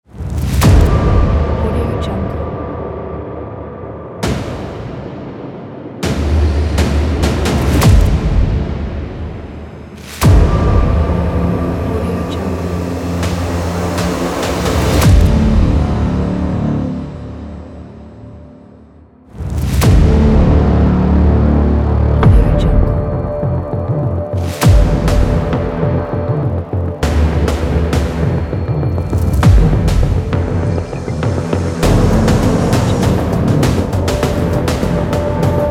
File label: action hybrid trailer